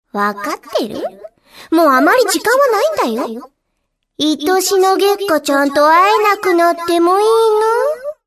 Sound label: bell